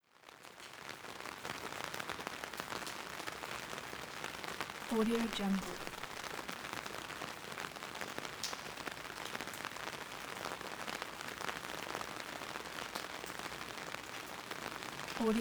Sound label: medium rain on umbrella; bestsfx